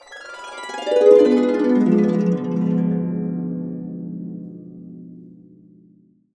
D DIM7 UP DN, Harps

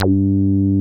MOOG HI G2, ANALOG BASS; Music Store - Claudius Diemeter Schwerlos

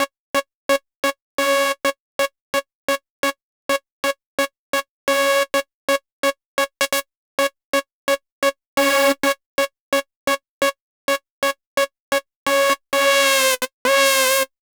NRG Lead; EDM; Sonic Foundry; Afterhours EDM; Ibiza Underground

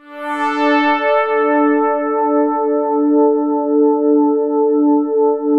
5TH HARD D3; SPACIOUS; Music Store - Claudius Diemeter Schwerlos; SPACIOUS 1

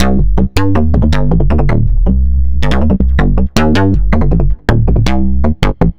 Synth Bass

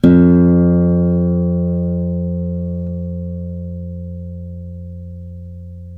ACOUSTIC, Guitar; ACOUSTIC FNG; East West - Steve Stevens Guitar Samples Collection

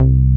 SY BASS C3, ANALOG BASS; Music Store - Claudius Diemeter Schwerlos

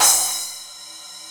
Crash; Digital Sound Factory Planet Earth

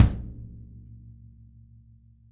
kick

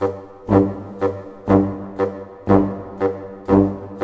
SNAR, Snare Army; East West - Scoring Tools

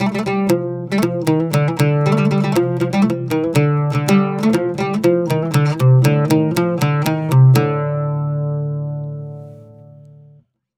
120 OUD, Mediteranean Traditions

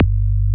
MOOG G1, ANALOG BASS; Music Store - Claudius Diemeter Schwerlos

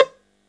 ELBZ PIZZC, BOUZ ELPI

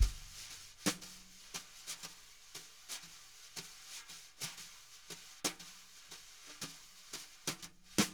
jazz brush loops

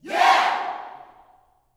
"Yeah", Yell Shout, Gospel

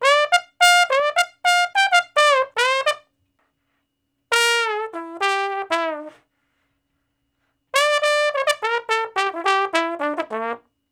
Bone Slo Blus (G IV I, V, I); Solos; Crimson Blue and Fabulous Horncraft 4 RnB; Horncraft for R&B

